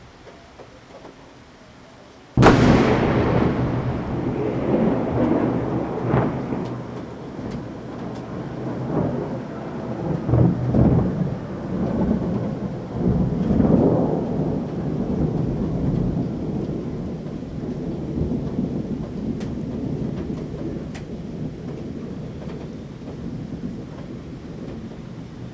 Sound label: Close Thunder